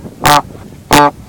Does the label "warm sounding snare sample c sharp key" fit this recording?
No